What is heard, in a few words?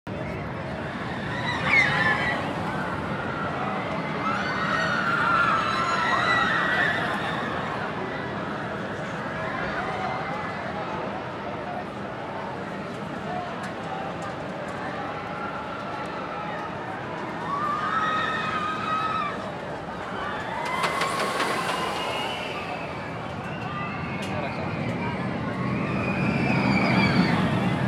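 Roller Coaster Ambience Crowd, Amusement Park; Wakerone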